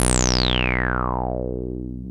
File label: SAW REZ 303, ROLAND TB-303 BASS; Rubber Chicken Software Sample Bank